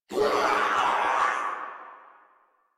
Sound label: death crewmen; Warframe; Corpus Crewmen; Crewman B